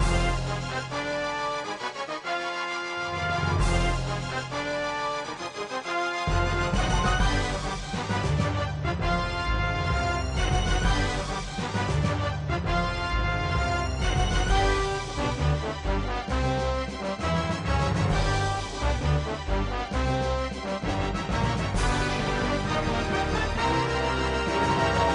music; BALLY Attack from Mars

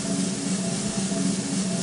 drum roll

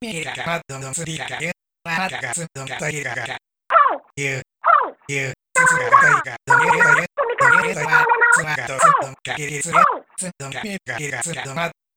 Rap Battle; Pearl & Cuttlefish

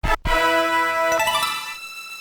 Item Fanfare

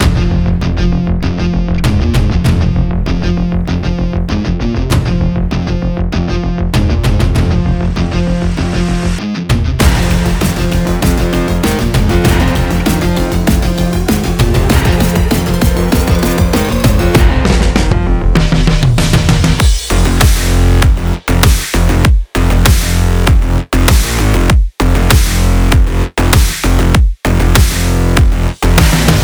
Powerful Electro Agressive sport; quick race linux macos ps4 switch windows gamerip 2021

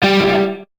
Guitar Note

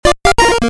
Target Win; curse crackers for whom the belle toils switch windows gamerip 2022

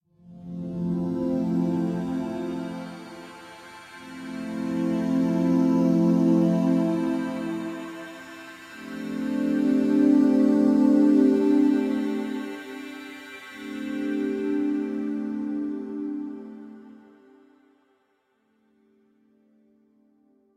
Tessellation Loop

